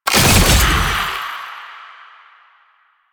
Fuel Projectile Super Pick Up; JadeShadows; Ascension

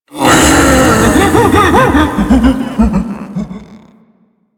Ape Prelate Capture Scream B; Enemies; Warframe; Proto Infested

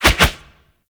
Necramech Melee Swipe; Enemies; Warframe

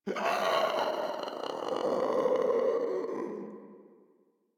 Infested Runner Death; Warframe; Runners

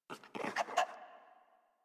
Puppy Idle Pant Grunt; Warframe; Kubrow

